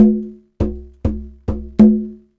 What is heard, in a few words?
Conga Loop (100BPM); musicradar